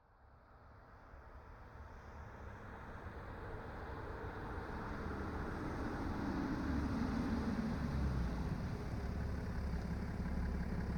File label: BMW F12 640D Gran Coupe exterior wet asphalt stopping from 40kmph and slowly drive away; static mono; Soundholder